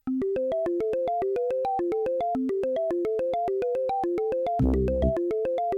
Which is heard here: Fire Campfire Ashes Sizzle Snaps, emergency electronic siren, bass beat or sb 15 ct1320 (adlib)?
sb 15 ct1320 (adlib)